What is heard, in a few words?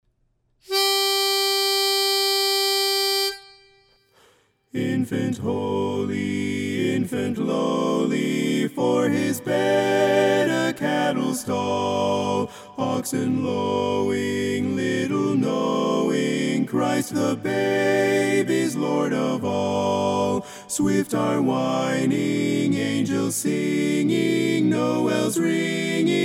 TENOR, Infant Holy, Infant Lowly